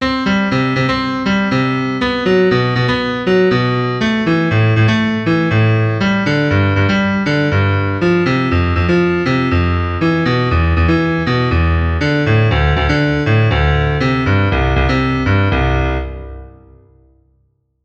bass excersize

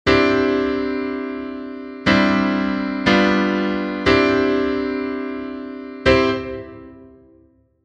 G6sus4

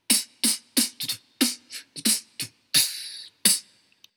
snare

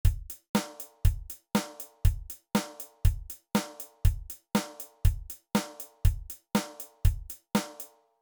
8beat

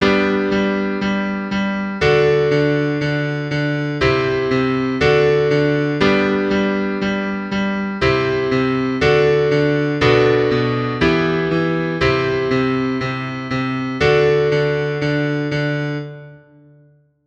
rock

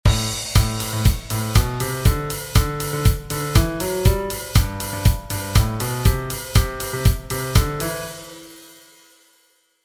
normal perc

